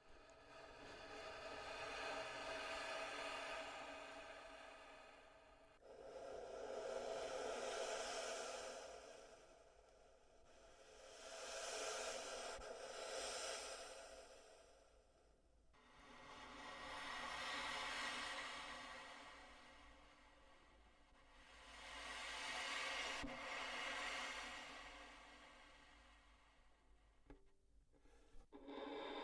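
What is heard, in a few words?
violin air bridge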